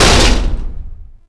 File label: hit